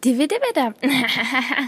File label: Dibe Dibe Dap Hahaha